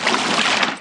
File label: Footstep water Heavy